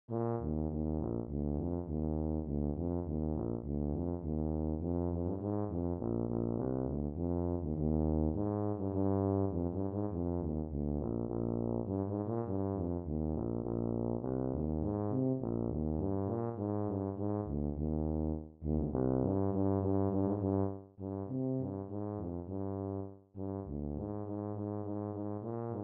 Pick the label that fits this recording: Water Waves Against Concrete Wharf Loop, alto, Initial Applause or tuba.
tuba